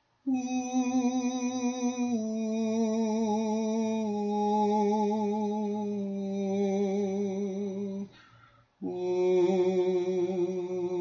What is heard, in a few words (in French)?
soprano